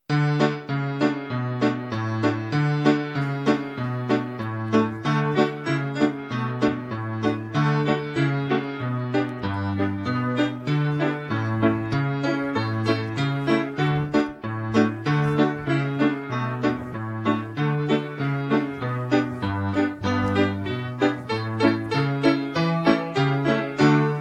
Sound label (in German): ohne Gesang D-Dur